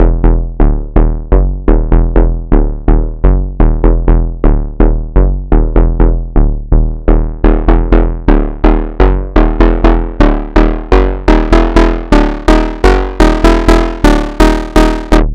Bass Loop E 125

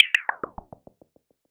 fx slip and slide

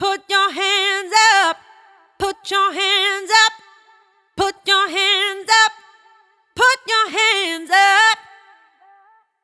Am Hook Put Your Hands Up Wet, 110

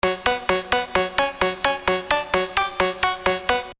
Cowbell; 7 SKIES

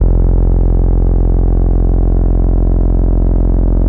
BASS F

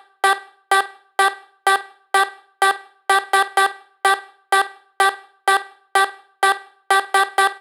Vocal Loop 126 C

